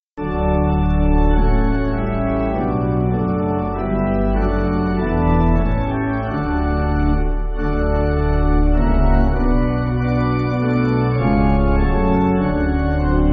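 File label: Pipe Organ, Music Backing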